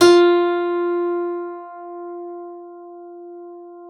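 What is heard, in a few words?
harpsichord F4; KLAVICYMB; Xsample VOL-8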